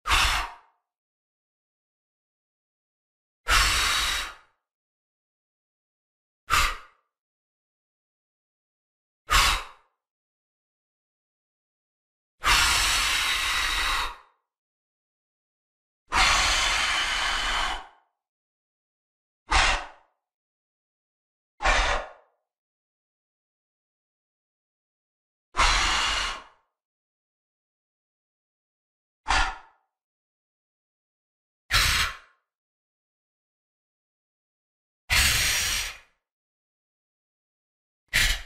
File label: Blow Out Candles Sound Effect; Karacsonyi tortenetek